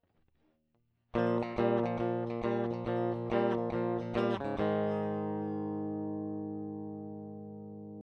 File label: Clean Combo